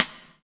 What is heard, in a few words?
Stick, Rimshots, Roland BK-5